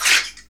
mouth, mouth klacks; Roland LCDP14 Africa VOL-2; Foot & Mouth

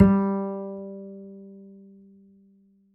Upright Acoustic Bass

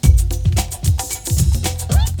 RERERE LOOP, breakbeats

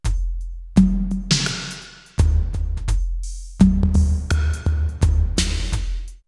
Electro, drum loops, drum machines; X-Static Goldmine

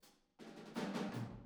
Boogaloo Intro Room ST, Drums, 82 BPM; Sonic Foundry; Drums from the Big Room The Mixes

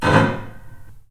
SCRAPE, The Piano; InVision Interactive

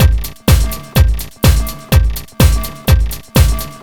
Drums, 125bpm, Nu Dance Electro; Zero-G; Soundclash; Combi Loops; Kaos Theory

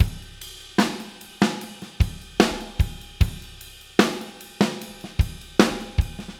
Beat Loops; Zero-G; Monster Beats

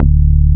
SUPER SUB, Techno Trance; E-MU Formula 4000 Series Vol. 2

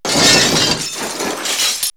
Brick; X-Static Goldmine

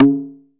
LATIN TOM HI, DRUMS; Music Store Sound Service; Einstein

